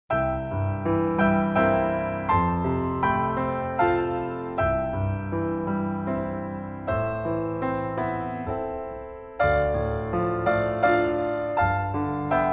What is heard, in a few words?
Whispering Hope, Keyboard Favorites